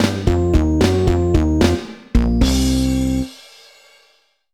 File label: Lose All Mini-Marios! (3rd Floor Death); mario vs. donkey kong 2 march of the minis; mario vs. donkey kong 2 minimini daikoushin; nintendo ds